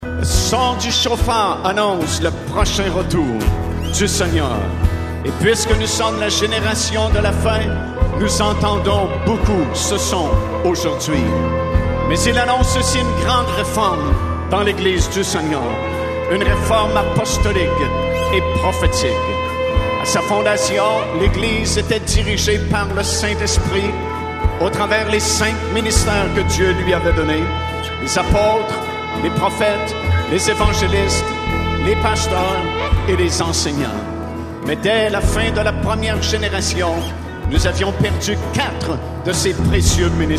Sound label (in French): au son du shofar